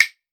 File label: Claves Oneshot Smartphone Recording